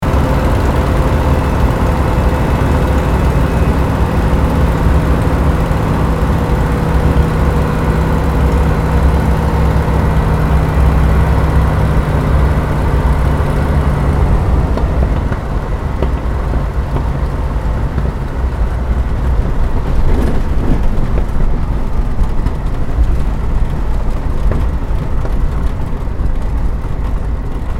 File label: aircraft supermarine spitfire mk-viii landing interior; 1944